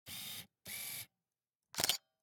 Camera, Pentax KS2, DSLR, electronic, mechanical, focus, out in, snapshot, quick; Eiravaein Works; Vaeyan IV